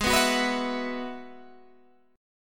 Ab Minor 7th Sharp 5th Ab, Guitar Standard; strummed; JGuitar

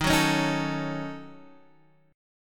F sharp Minor 6th, D sharp, guitar chord

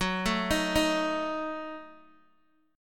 F sharp Minor 6th, Guitar Open G; chordshape; down

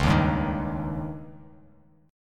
Db Suspended 2nd Flat 5th Db down Bass Standard; strummed; JGuitar

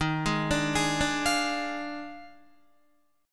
Eb Major 7th Suspended 2nd Suspended 4th, Guitar; arpeggiated; chord; JGuitar

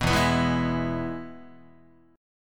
E-Major E, Guitar; strummed; JGuitar

